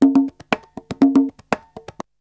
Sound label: Drumloop; ZERO G - Datafile DISC 3